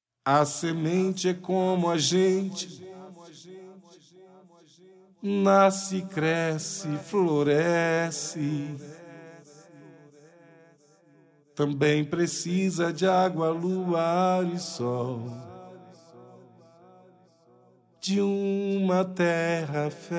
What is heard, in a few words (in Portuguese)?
vinheta; semente